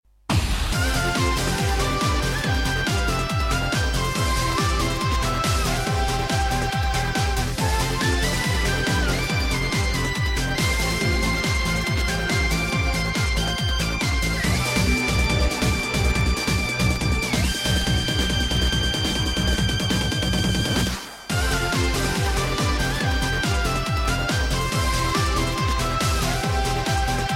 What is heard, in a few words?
Stage Clear